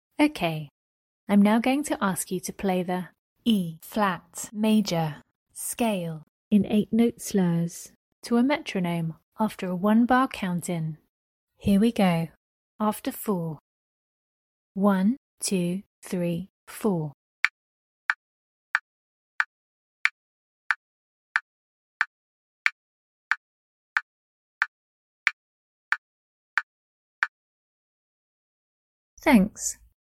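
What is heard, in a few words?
E flat major scale 8 note slurs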